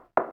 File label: Keypress Spacebar; ui